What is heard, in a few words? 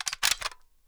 vollmer bolt back; mg; garrysmod